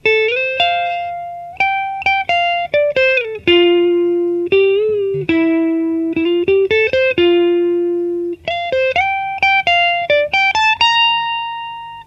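Strat clean neck, Neck pickup, Канал clean Fender Frontman в линию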